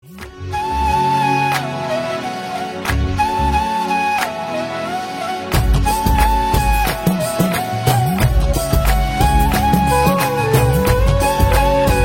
BGM Flute Ringtone

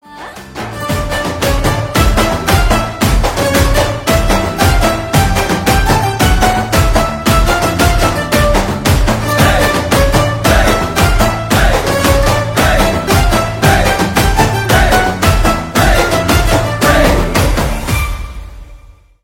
Drum Beats BGM Ringtone

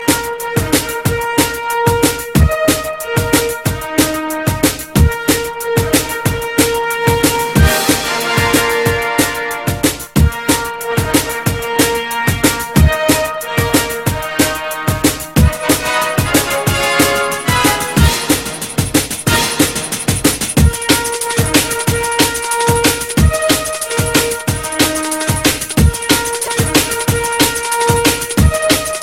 Rock Ringtone

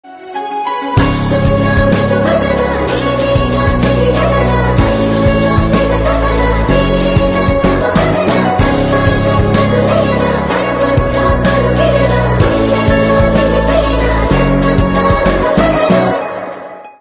BG Chorus Ringtone

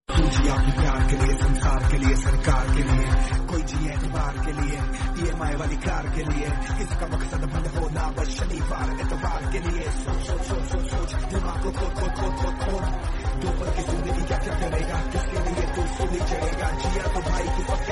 Rap BGM Humming Ringtone; The Family Man

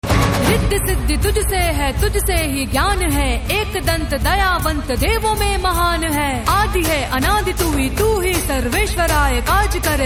Aarti Ringtone Female Version

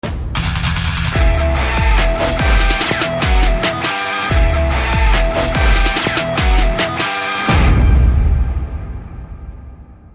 Theme BGM Ringtone; Kaappaan

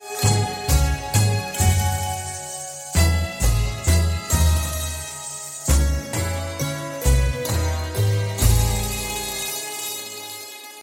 SMS Ringtone; Ram Leela

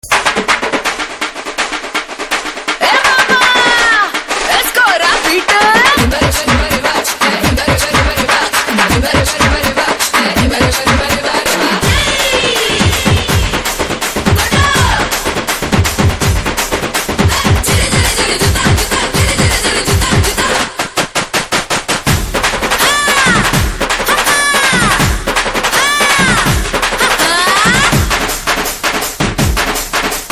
BGM Drum Beats Ringtone; Seetimaarr

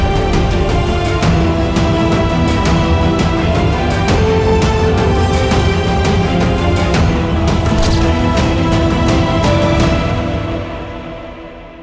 Movie Instrumental Ringtone; Shikara

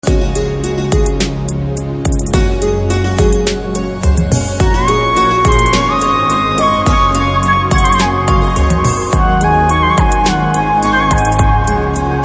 BGM Piano Ringtone

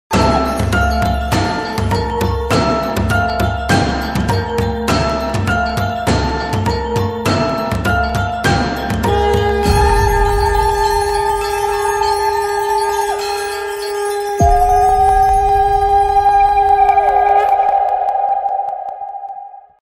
BGM Ringtone; lakshmi bomb

